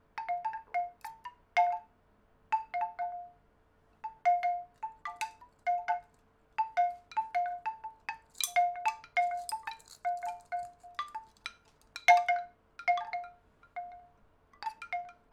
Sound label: wooden windchimes